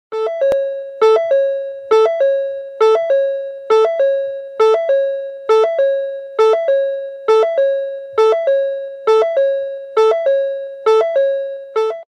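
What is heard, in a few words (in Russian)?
звуки дверей автомобиля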